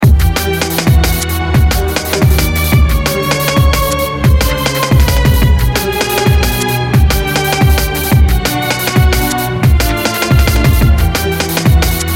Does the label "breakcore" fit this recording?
Yes